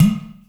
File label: EQ Perc, Percussion Mix